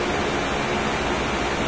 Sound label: machine hum; Source Engine; tf